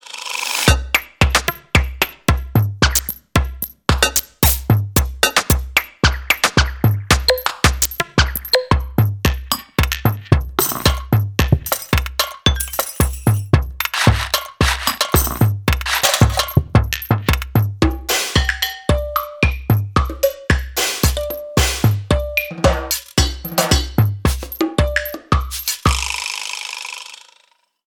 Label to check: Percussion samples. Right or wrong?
Right